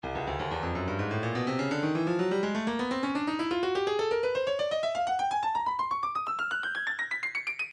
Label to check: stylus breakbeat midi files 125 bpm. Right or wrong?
Right